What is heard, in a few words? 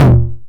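909 mid tom tape saturation; Toms; Goldbaby Tape808 & Tape909; The Tape 909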